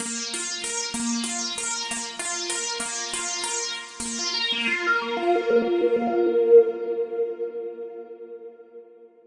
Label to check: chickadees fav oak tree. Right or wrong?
Wrong